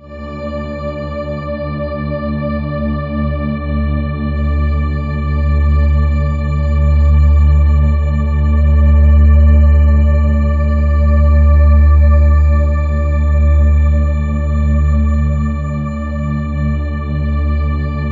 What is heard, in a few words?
WAITING, SYNTH SND, Ominous Drones; USB Soundscan vol.48; AKAI